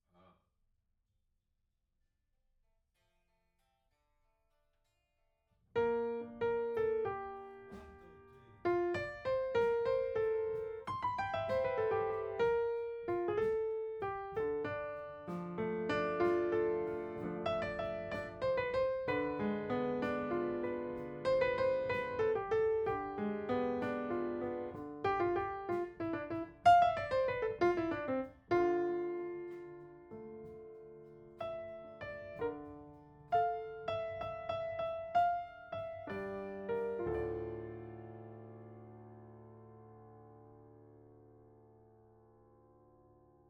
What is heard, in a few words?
Piano B